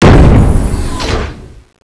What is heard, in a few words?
attack jump; mad machine